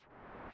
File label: collect